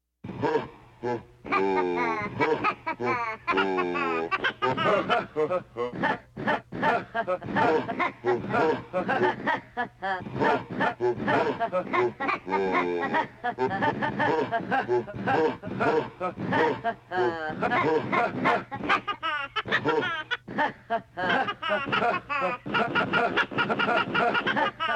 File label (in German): Lachen Elektronisches Gelaechter